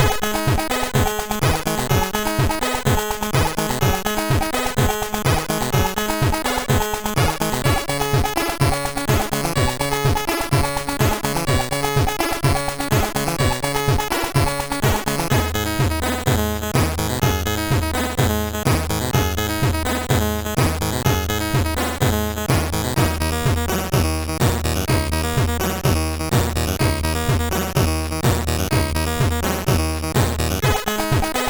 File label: Acid, MOS6581R2